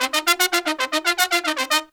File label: Pop & Funk Brass, R; Best Service ProSamples vol.25; AKAI